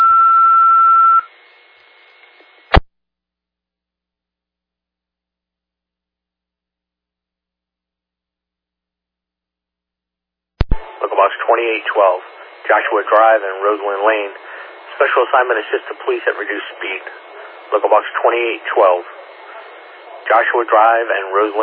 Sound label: alert page received